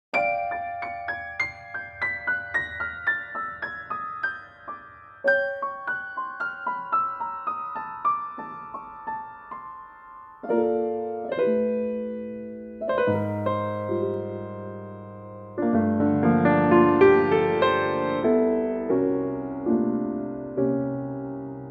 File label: F piano; Pianotrak; key - F; vocal range - A to A (optional Bb top note); Haunting and beautifully slow piano only arrangement of this sublime ballad